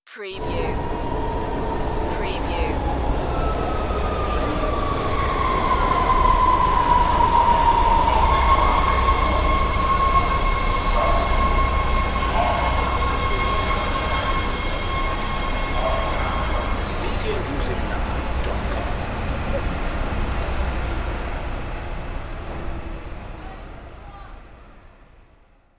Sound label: Subway Arriving